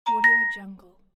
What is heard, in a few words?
alert